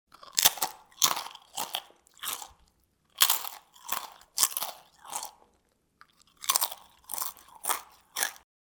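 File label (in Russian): хруст чипсов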